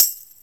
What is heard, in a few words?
tambourine